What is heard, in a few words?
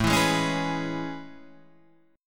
A Major 9th A